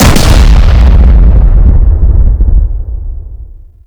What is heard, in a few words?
Grenade Explosion; Warframe; Generic Grenade